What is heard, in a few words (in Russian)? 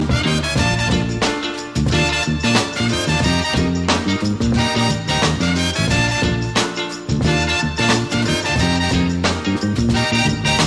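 хорошая джазовая музыка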